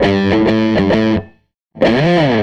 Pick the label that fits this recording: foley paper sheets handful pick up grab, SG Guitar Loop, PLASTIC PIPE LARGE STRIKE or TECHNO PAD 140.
SG Guitar Loop